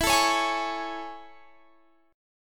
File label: A Major 7th E